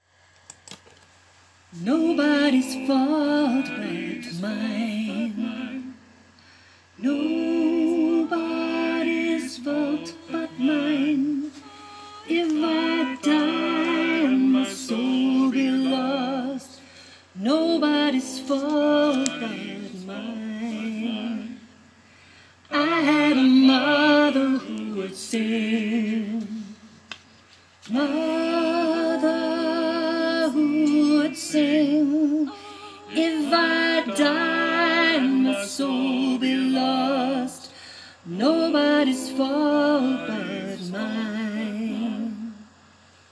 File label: alto